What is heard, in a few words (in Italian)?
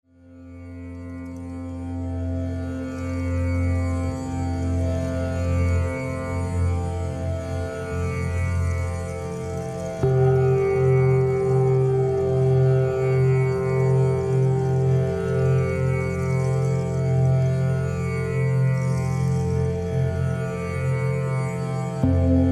Solfeggio Fa Campane tibetane